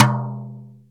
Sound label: brush slap t, Jazz Brush